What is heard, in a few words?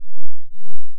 triangle f1 h16